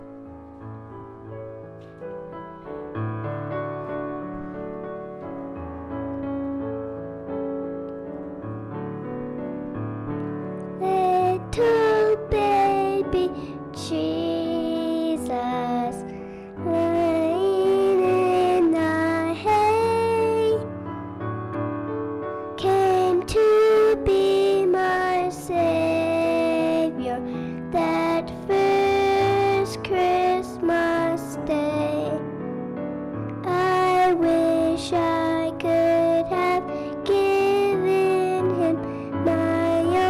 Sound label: singing special